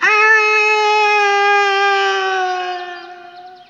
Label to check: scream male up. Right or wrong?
Right